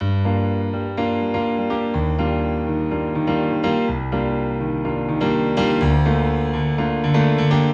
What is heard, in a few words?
House UKG Piano Gm 124bpm; 3pack piano bundle; HOUSE & UKG KEYS